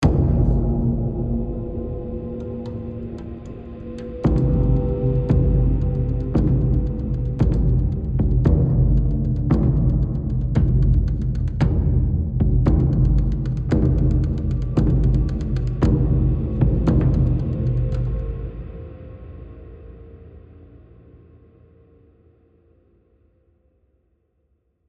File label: Tension High Chunk